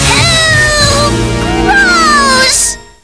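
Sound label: eeewww gross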